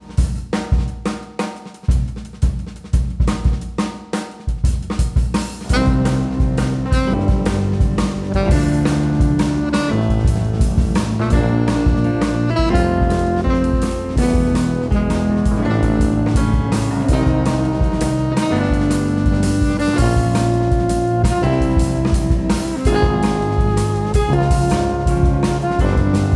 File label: Prelude For Drum And Bass